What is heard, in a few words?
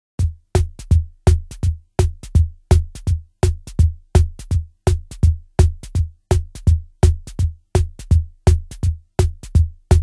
RHYTHM SWING